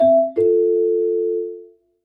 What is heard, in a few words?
Wrong Answer; Beautiful Girl Fight School